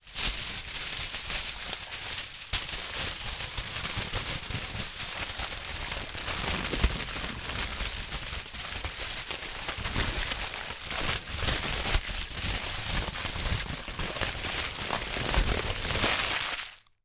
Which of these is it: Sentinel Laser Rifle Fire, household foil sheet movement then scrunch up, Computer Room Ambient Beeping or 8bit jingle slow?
household foil sheet movement then scrunch up